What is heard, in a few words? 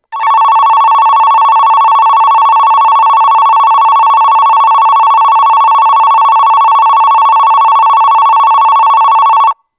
Kannami Bell